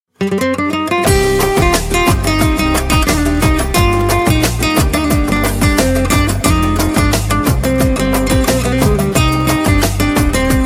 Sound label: Turkish guitar; Indian style guitar tuning